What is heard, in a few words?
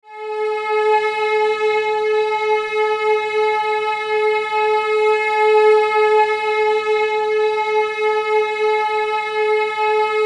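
High G sharp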